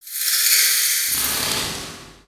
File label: bats pitched; ninjago